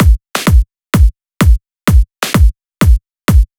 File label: drum loop 128 Strp; Electro; drum loops; Anthemic